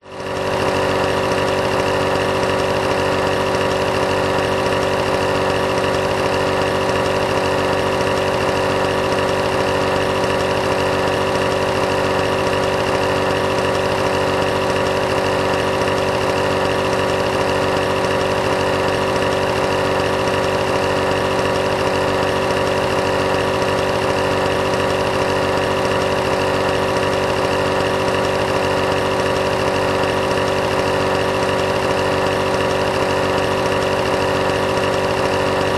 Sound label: household lawn mower petrol idle close up; zapsplat